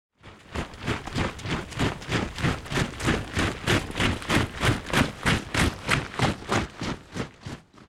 soldiers step

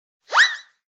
slip

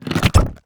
volley

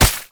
plant strike

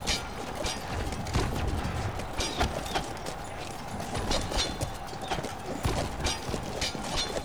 battlefield environment

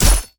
spear

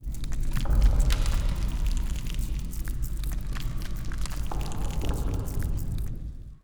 ice out relic

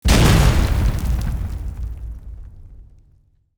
land impact

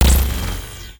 bound hit

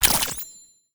camera

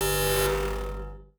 ui alarm enter

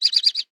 birdling chirp

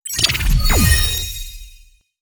ui killboss normal

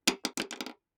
pen drop

